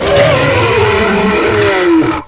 Giant Mutant Death; Playable Tutorial Level; TR1

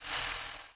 Seal Mutant Brush Tail; Playable Tutorial Level; TR3

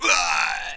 Mercenary Death; London; Playable Tutorial Level; TR3

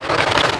Wings; Croc god; Playable Tutorial Level

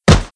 Generic Knees Fall; Playable Tutorial Level

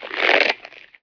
Swinging Trap; Playable Tutorial Level; TR2

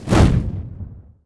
Shoot; Tony; TR3; Playable Tutorial Level

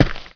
Knifethrower Feet; Playable Tutorial Level; TR2

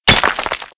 Stone Hit; Playable Tutorial Level

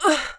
Climb; Lara; Playable Tutorial Level

